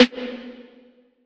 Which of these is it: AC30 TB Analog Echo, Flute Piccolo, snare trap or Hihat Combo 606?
snare trap